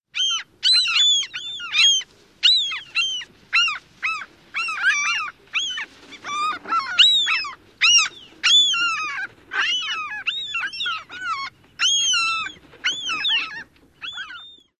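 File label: alarm mobbing calls, Mew Gull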